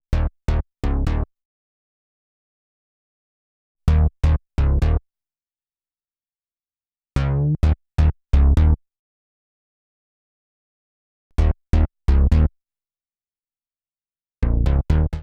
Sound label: blower bass 32 beats